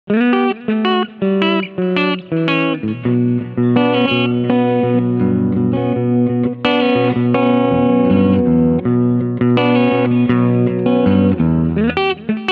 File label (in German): Clean Riff beide Humbucker